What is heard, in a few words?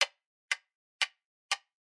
clock room close; nacre